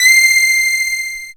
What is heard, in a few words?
syn c, Dance Synths; ProSamples vol.37; Juno Jam; Best Service